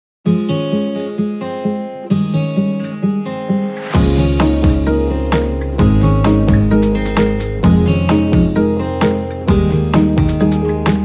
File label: Ringtone